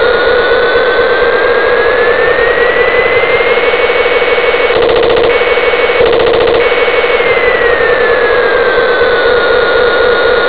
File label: jet